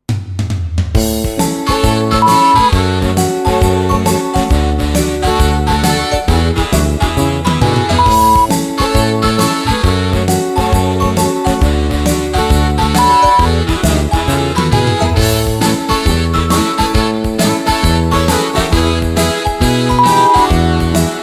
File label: XMas Rock 135